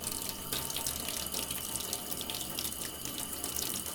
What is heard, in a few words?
Tap Basin; The 39 Steps